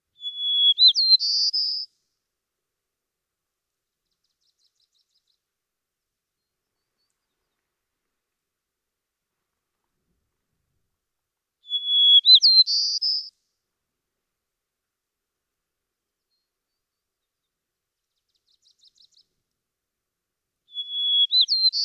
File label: White-crowned Sparrow